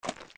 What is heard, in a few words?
steps; Jabu Jabu; zelda; OOT